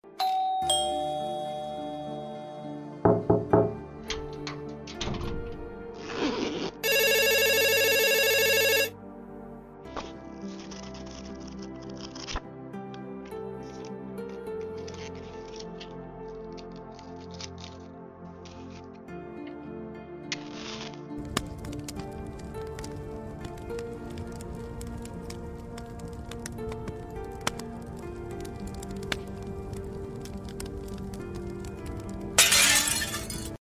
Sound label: household items sound